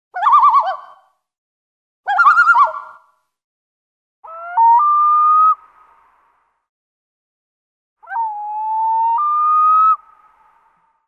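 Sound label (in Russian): полярная гагара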